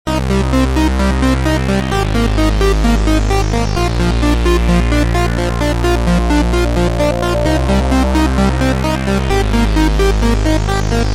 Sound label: CHARLATAN FRETTA